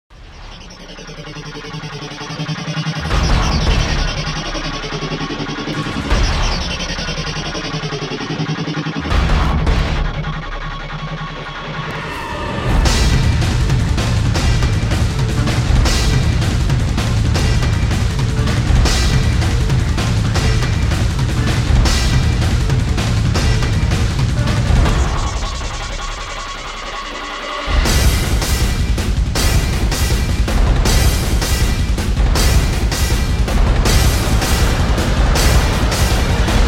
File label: drums